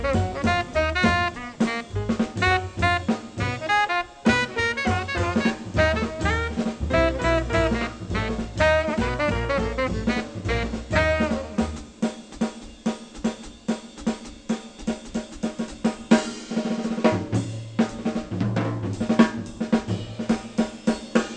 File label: jazz